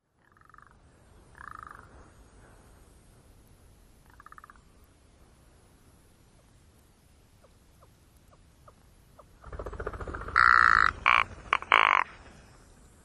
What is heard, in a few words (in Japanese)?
raicho; ライチョウ; 長野県乗鞍